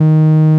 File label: Inharmonicity D#3